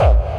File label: Hardkick